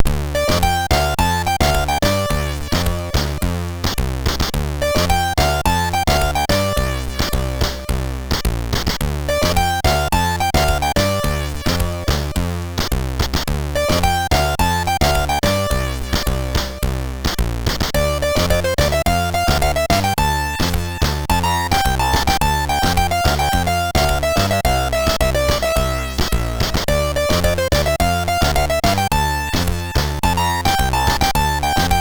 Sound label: Lights On, MOS6581R3